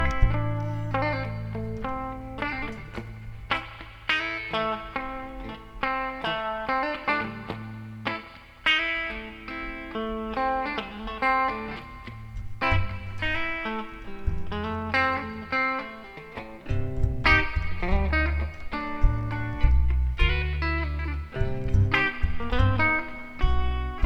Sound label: Guitar And Bass